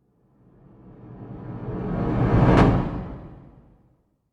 sound design hit build suspenseful cinematic, Reversed Hit; Sound Design - Suspenseful Reversed Reverb Building To A Hit / Bang; Hits; McKinney Sound